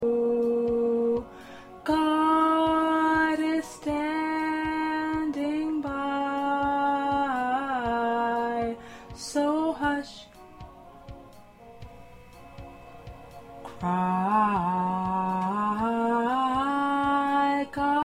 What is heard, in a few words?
Tenor, choir parts